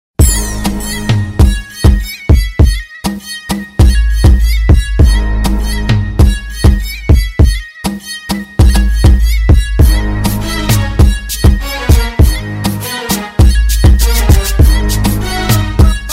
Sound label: arabic style ringtone